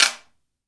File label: GUIRO